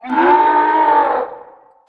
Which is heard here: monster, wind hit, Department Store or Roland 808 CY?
monster